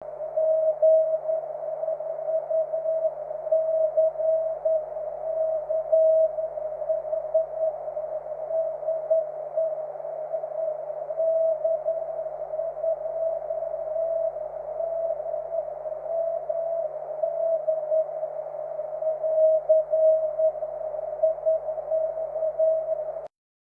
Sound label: cw, 23cm